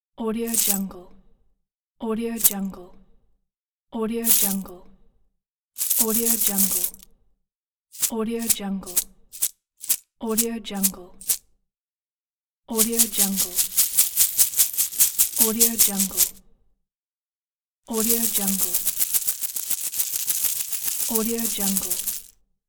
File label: bag of coins; sounddivision